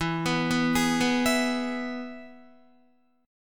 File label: E-Major E, Guitar; arpeggiated; JGuitar